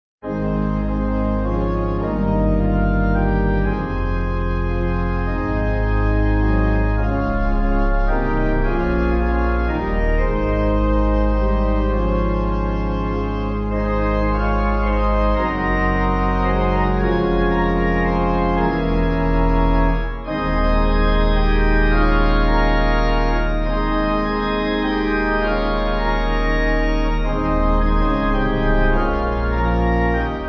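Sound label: Pipe Organ, Music Backing Files for Churches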